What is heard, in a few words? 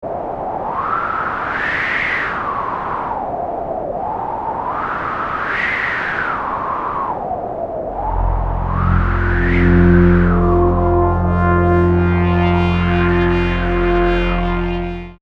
Dust Storm, Prophet '08